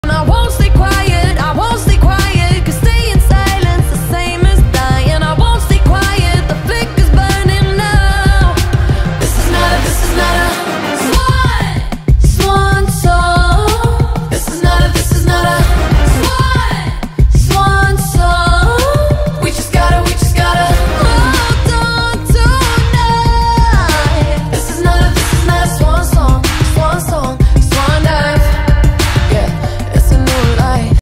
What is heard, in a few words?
english ringtone